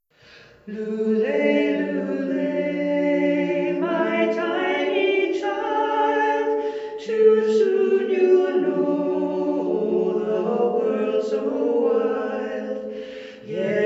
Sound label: Wexford acapella